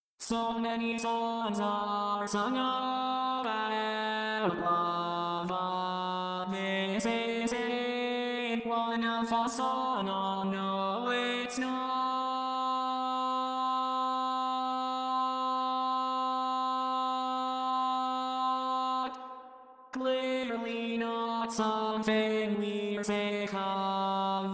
Bari, barbershop tags

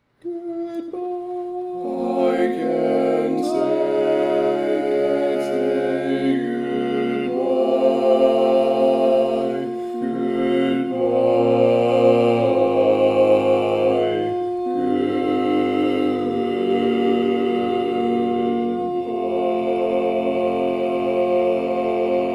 All Parts, Barbershop Tags

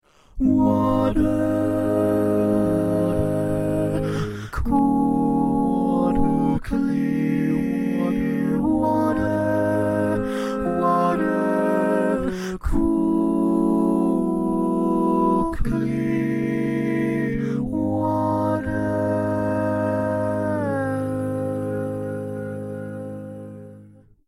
tenor

